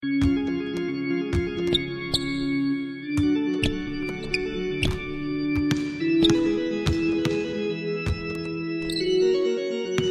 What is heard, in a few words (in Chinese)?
风琴上行; nba